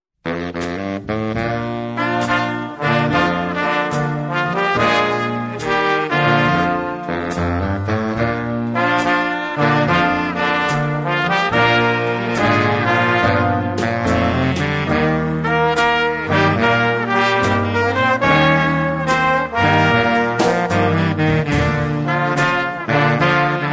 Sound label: blues